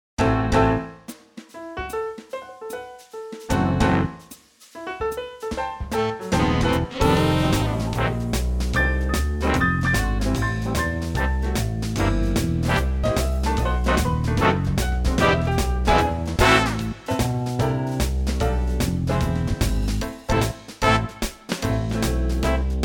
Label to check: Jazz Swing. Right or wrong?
Right